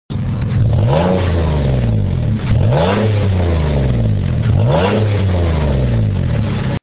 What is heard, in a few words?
Pathfinder (fly by) 4.0L Single